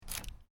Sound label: Car: Key Out Of Ignition, Interior; Cars; Vehicles; Stuart Duffield